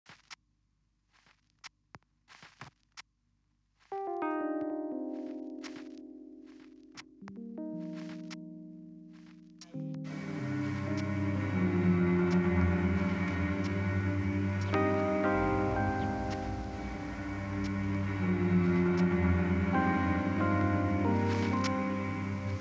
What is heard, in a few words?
instrumental